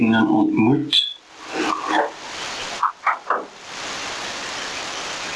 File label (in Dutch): klopjes